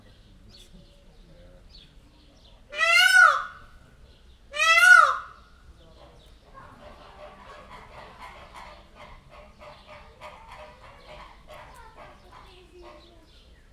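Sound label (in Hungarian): ketszer, indiai pava; Győri Zoo 2014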